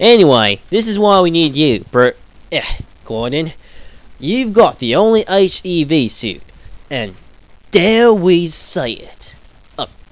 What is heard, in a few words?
ahh, scientist